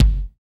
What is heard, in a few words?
BD, dance; Sound & Vision - Gigapack I